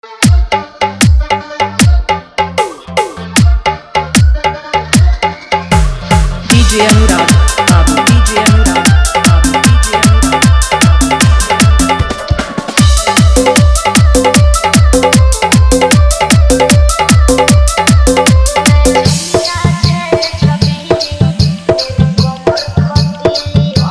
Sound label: Dhollki Bass Rod Dance Mix